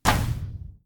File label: Explosion